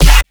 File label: Kick Dist Crow